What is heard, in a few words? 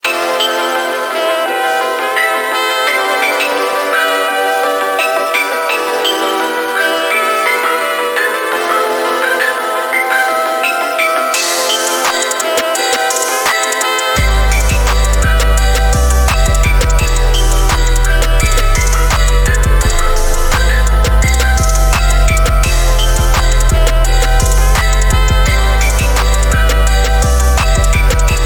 Chirping (Instrumental)